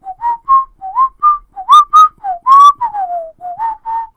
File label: WHISTLE; DN3D; Duke Nukem 3D